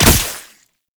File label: Wall Climb Dodge End Point; Warframe; Oraxia